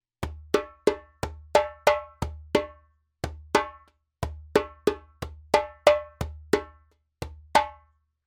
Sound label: djembe; djembebog oevelse